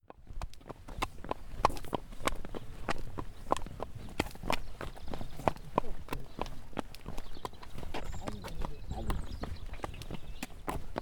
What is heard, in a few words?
Unshod Horse Going Slowly